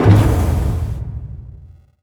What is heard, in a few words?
constructor tank retract stop